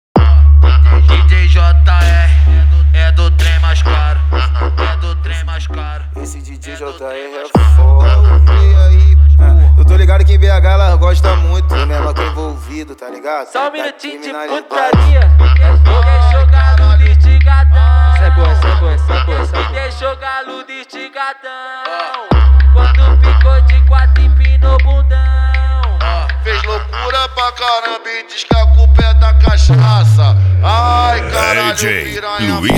funk automotivo

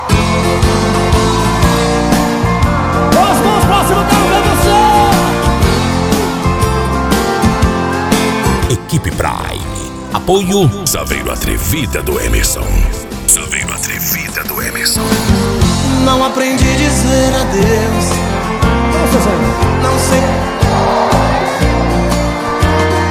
moda de viola; frequency mix

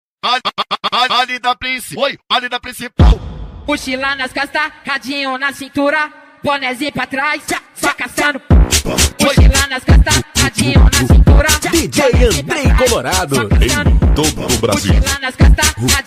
baile funk

